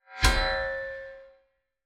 Shield Metal Hit; The Captain's Shield; Super Heroes Sound Design

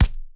Punching Percussion, Straight Glove, raw; 99Sounds

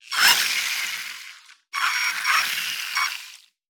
RC-Car Idling, Reely Core Buggy; SoundBits

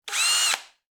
electric drill

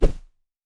Organic Whoosh; CB Sounddesign; Infographic SFX

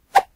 woosh; Kenney's Sound Pack

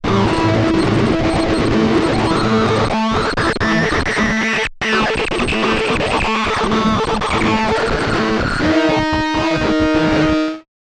Textures; 99Sounds; The Weird Side Samples

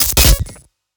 Glitch, Digital Glitch; Total Khéops; Dark Atmo And Digital Glitch Vol.2

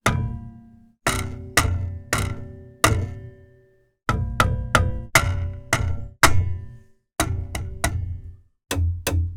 Metal bang ringing; oktava; SkewSound; reel2reel

